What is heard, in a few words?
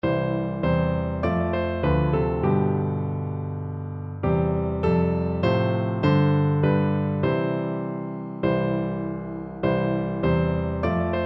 ding dong piano duet